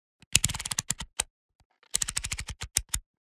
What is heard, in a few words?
dial